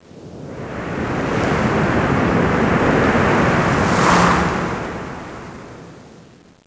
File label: STORM; MARS